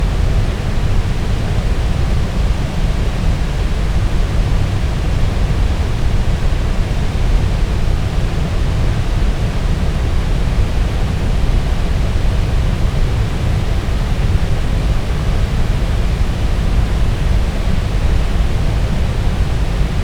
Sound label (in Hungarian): lezuhano gleccserfolyo